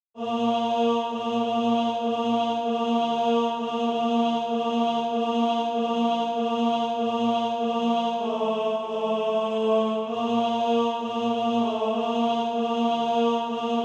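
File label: tenor, SATB Practise Music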